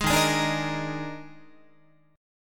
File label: Eb Major 7th Suspended 4th Eb, Guitar; strummed; 11,11,11,12,14,14; EbM7sus4